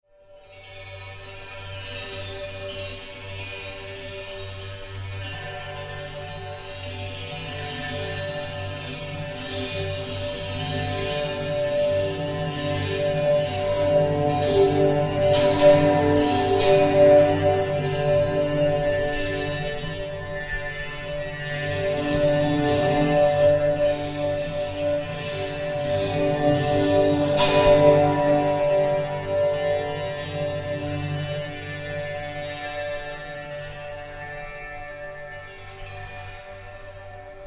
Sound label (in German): Bordun